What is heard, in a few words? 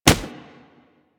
Melee Fist Impact Generic